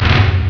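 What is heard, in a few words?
kick; JohnCage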